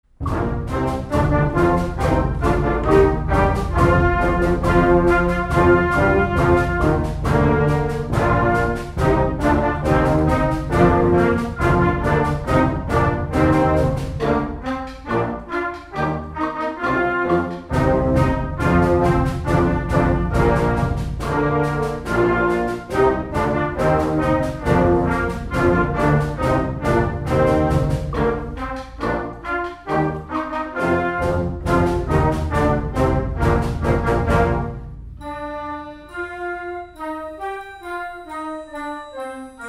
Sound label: march